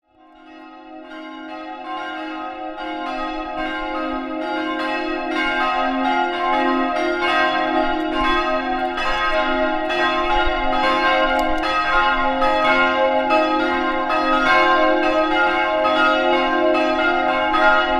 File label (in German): vollgelaeut